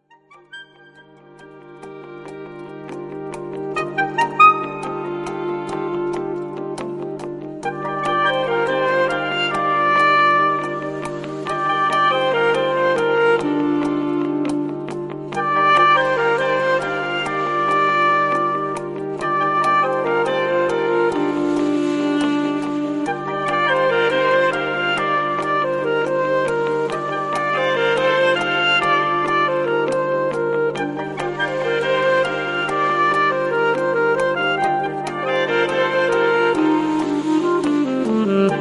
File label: Alto